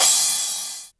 crash, Drum Machines, Korg ER-1; Kit 16

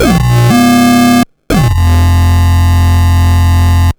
Sh09 Bass Loop, 120BPM

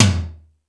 Toms, Drum Machines E-MU Pro-Cussion

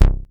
short C2, short bass; Best Service Dream Experience

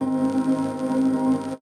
ooooooooo, vocal

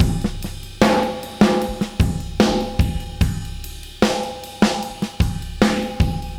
Beat Loops; Zero-G; Monster Beats

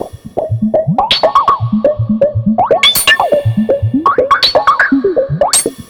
Glitchy, Beats

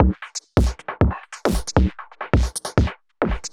Beat Mod, 136bpm, uk garage

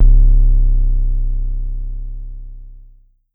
Pollard Syndrum Sub

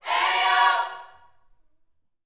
Vocal, Gospel, PHRASE; Spectrasonics

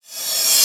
Reverse Cym, Cymbals

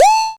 TECHNO; X-Static Goldmine 1

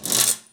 Prepared Drums

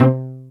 pizzicato C3; Greytsounds; Scott Peers Sound Engineering

